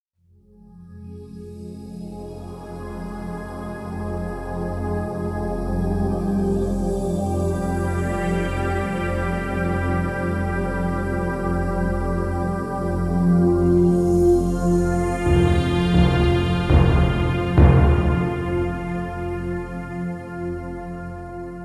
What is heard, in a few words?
Foreboding Synth